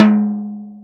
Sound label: tom, velocity tom; VELOCITY TOM 2